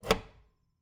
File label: Cabin, outer doors, double doors, tension, latches, wooden, screens, slide bolt, wide; Eiravaein Works; Vaeyan IV